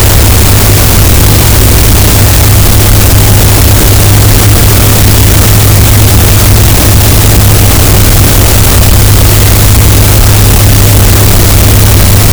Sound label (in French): cachalot positif, Physeter Macrocephalus, gaussian noise